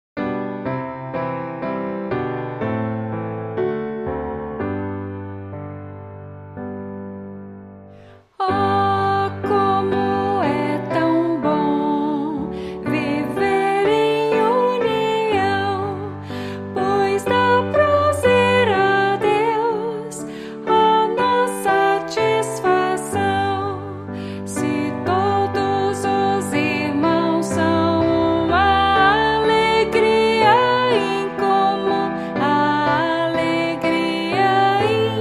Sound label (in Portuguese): voz piano